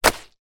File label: wet towel drop on ground; foley; zapsplat